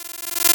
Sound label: 8bit se